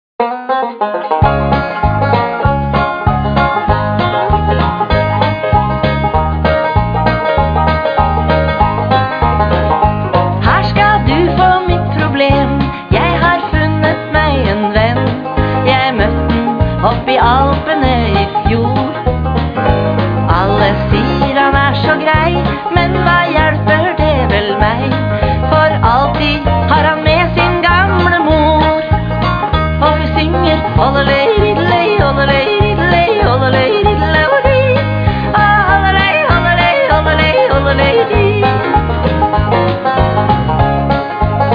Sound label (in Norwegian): sviger jodler